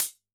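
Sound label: Closed Hat; drum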